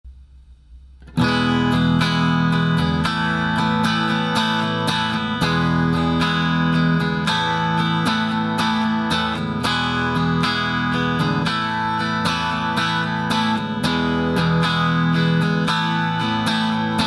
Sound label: guitar